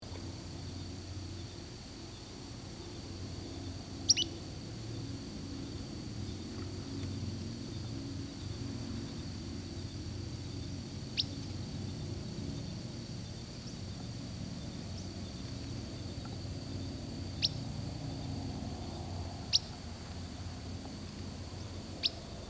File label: Empid